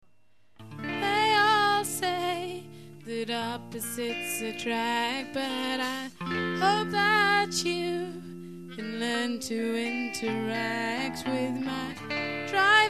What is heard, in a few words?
Beat Sample